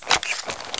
move, weapons panzer; nzp

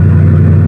engine; q3rally; gremlin

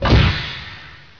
door stop

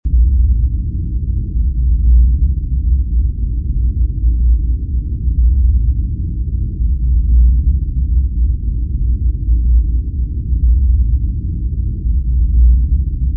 ominous, ambience

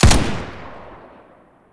machine gun, weapons; q3rally

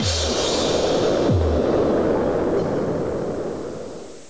splash

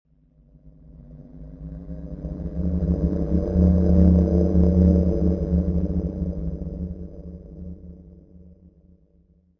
distant moan, ambience

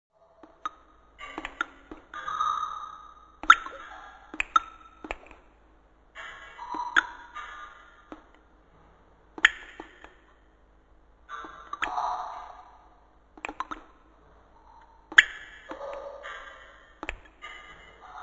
echo drops, ambience

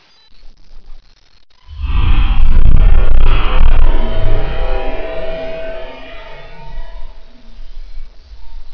forbidden, ambient; q3rally

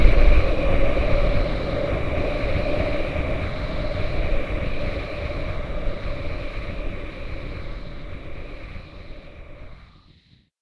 pain wind

